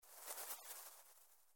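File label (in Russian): butterfly fluttered up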